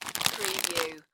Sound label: Bag Plastic Pick Up; foley; Sound Effects Bible